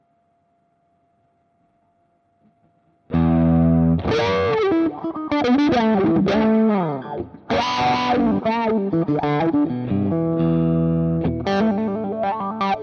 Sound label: LD Crunch